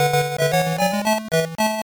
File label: Level Start; Alex Kidd in Miracle World; Master System